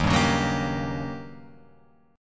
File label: D sharp 7th Sharp 9th Guitar Open F; strummed; JGuitar